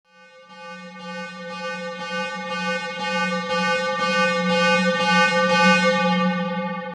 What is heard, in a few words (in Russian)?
arteleriiskii obstril zvuk